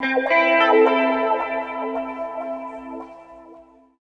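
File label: guitar, right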